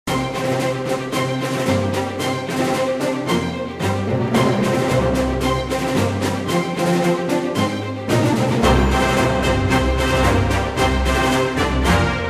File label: Gameplay Music; Tanksshock